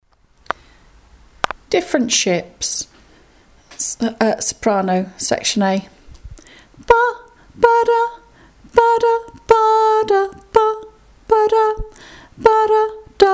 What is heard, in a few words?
Different Ships sop, choir